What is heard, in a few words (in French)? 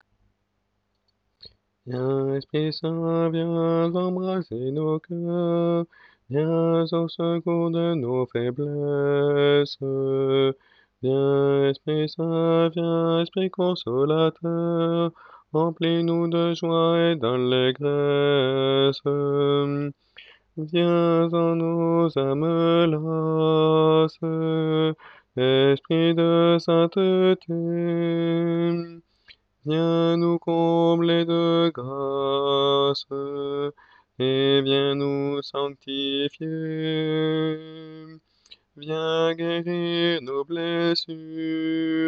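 Viens embraser nos cœurs alto; mariage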